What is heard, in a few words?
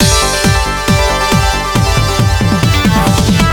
Round Start; Future Extreme 20XX